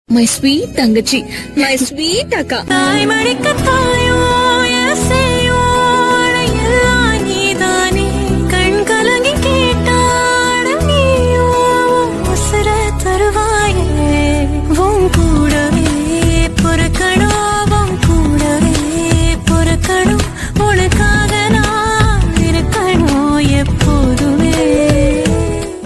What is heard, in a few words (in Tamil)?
akka thangachi love tamil sister song